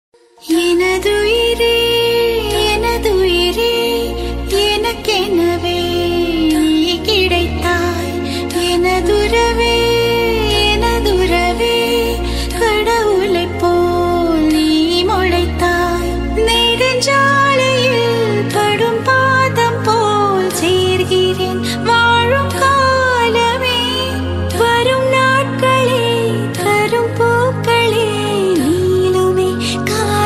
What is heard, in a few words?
female version tamil; missing; freaky bgmz